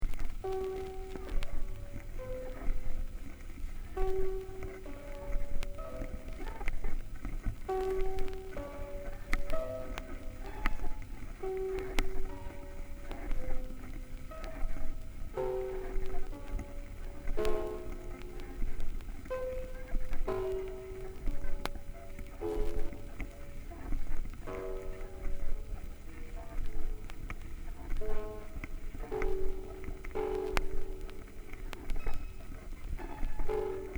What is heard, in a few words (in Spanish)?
fuga, concierto para arpa y quinteto